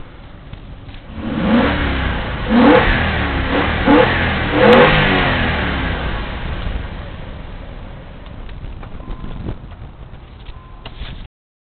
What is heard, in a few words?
DOHC V8